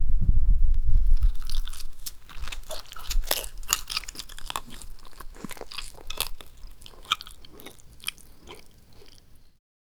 cow eating grass chewing, livestock

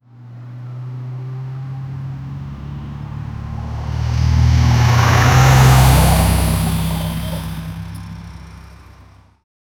heavy vehicles colliding; collisions

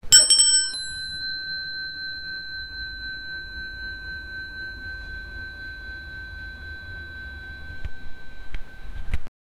the sound of a ding, hotel

